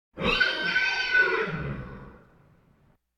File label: Animal effects snorts grunts farm ambience